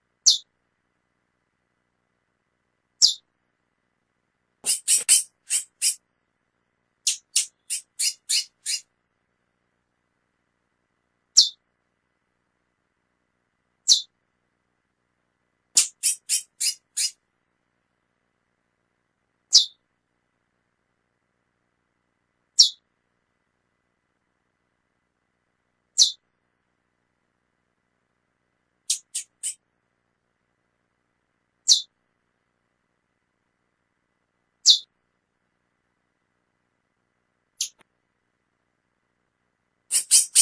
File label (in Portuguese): Piado de femea de curio